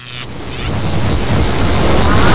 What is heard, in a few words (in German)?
blitz start; hurrican